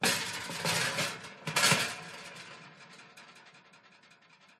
glitched tones household kitchen cooker grill pull out clatter